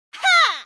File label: attk